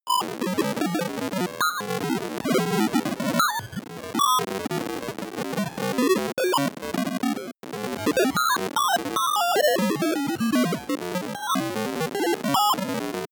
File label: broken computer random long